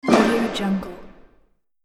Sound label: impact magic boom